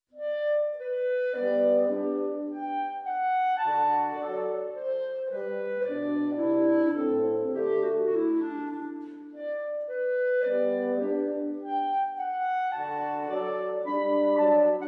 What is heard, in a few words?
Quintet; in E Minor, op 88, no.1